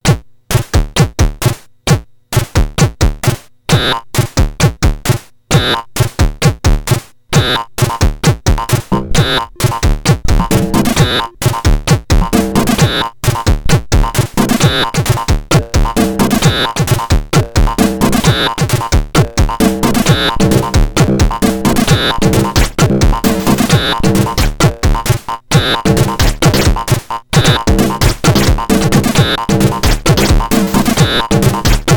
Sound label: drums preset, midibox sid